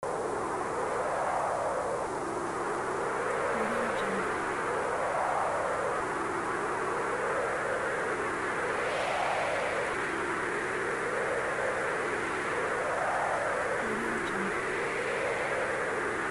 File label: wind